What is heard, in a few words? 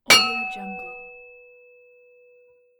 metal hit